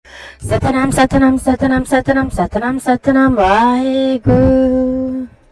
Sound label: 8 beats